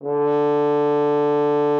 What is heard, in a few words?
FR HORN D3; Xsample VOL-3